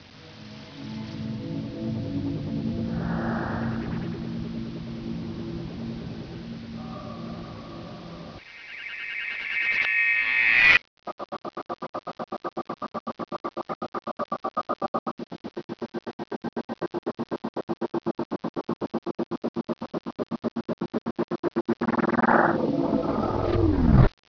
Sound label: song; cartooncity